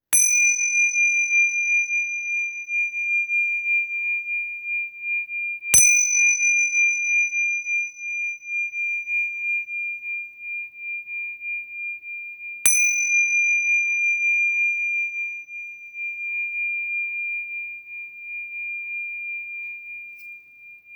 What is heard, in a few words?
Tingsha